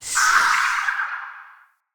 flock projectile whizzby; Enemy; Abilities; Thawtide Hunter; Sinecure; Ranged Hunter Thawtide

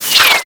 Ink Rifle Reverse Start; Warframe; Tenno

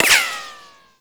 Exalted Sniper Ricochet; Warframe; Frumentarius

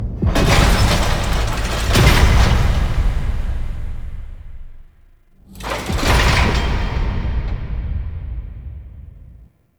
Metal Machinery; Warframe; Tau; Cinematics

